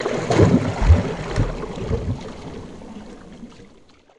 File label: Canoe Paddle Stroke Underwater